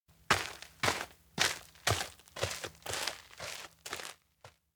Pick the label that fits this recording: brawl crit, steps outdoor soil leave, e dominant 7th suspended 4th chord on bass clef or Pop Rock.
steps outdoor soil leave